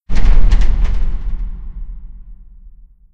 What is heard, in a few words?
train rattle; video games; source engine; portal2